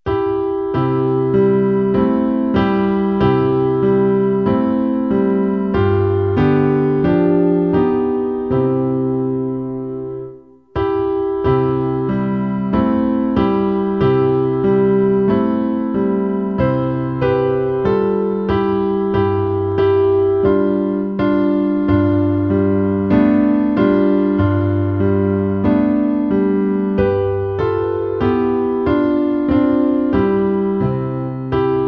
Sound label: reverently quietly music only; childrens songbook; dutch